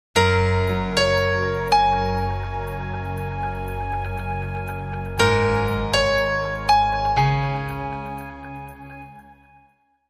Piano Logo

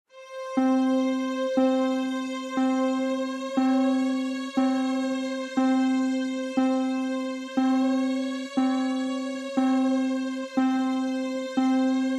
Atmospheric Synths Mix

